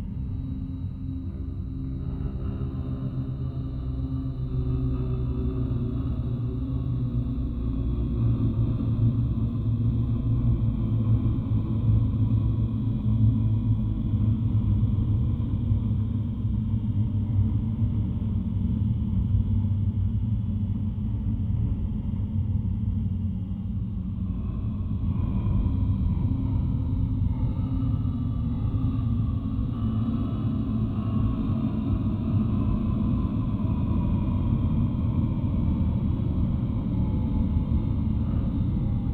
ambient; Soldier of Fortune Payback; PS3, Windows, Xbox 360; ukg

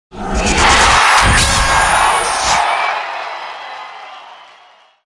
Music; Music Frontend

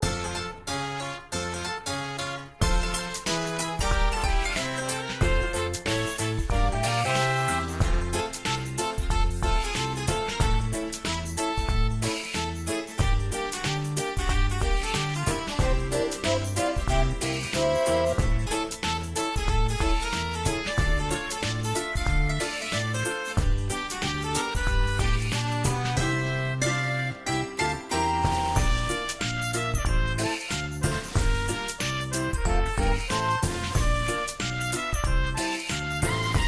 Blast Lobby Bgm

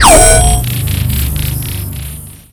Menu Cancel

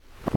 Footstep Rock Rev

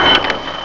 Gatling End

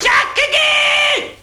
Japanese Shout; gamerip; Mars Sortie

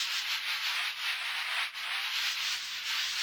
Mushroom Turret Projectile Move

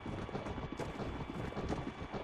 Drill Dig Loop

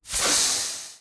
Mech Footstep Air

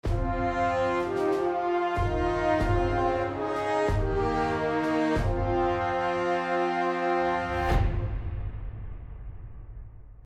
Brass Suite; Kingdoms and Castles; Merchants and Ports

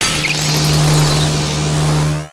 Ground Energy Sound

